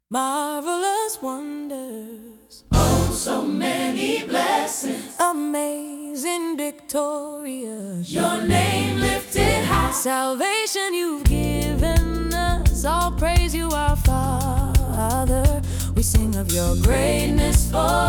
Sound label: Funky Soul Version Female